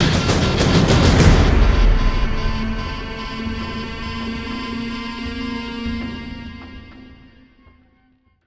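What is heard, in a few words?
sting, drum fill guitar feedback; transformers war for cybertron gamerip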